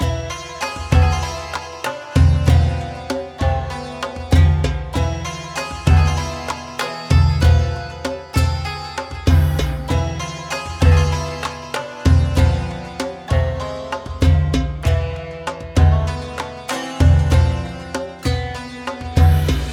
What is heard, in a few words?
BGM Parmesan Desert